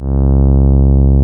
TAURUS; Taurus 1

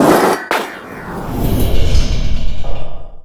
PLASTIC; USB Soundscan vol.33; AKAI; BLASTER

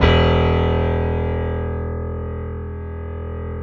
PIA PRO, Roland

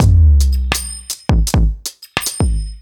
Kit Wonk, 85bpm; off the grid

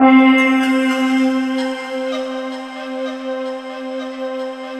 GOA C 3, Korg; Back In Time Records; Korg Universe VOL-1

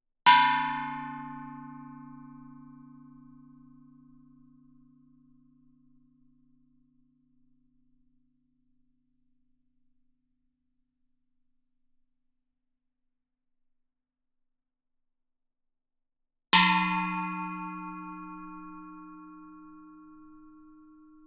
Gamelan; Propeller Island - Complete Gamelan

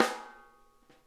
RIM, SNARE; Sonic Reality; Interactive Drum Kits

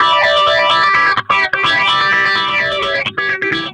Guitar, 128bpm, A2, analogue circuit; musicradar

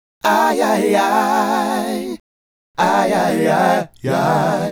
Male Group, Vocal

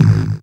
synth, Dance Synths Trancers; ProSamples vol.37; Best Service

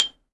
XYLOPHONE, Percussion; ILIO

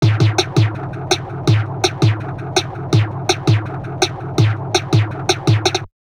DIST, Drum Loops Crazy Processed

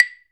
CLAVE; Sonic Reality; Interactive Drum Kits

